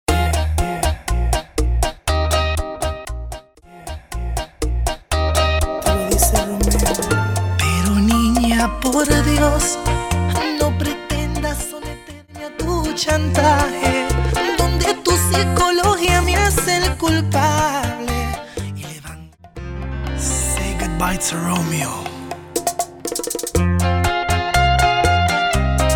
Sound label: Intro Outro 120bpm